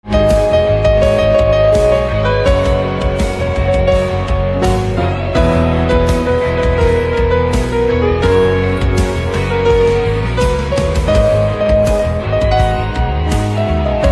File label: Piano